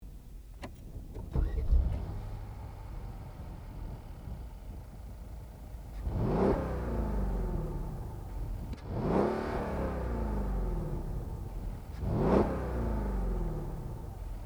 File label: Mercedes Benz CL550